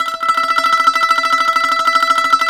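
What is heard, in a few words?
BGL TREM E 3, bağlama tremolo; BAGL.TREM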